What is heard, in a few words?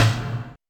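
Snr Lo Gated, snare; Alesis D4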